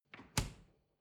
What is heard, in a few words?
refrigerator door close short